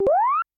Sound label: jump; SM64 CO-OP; Junio Sonic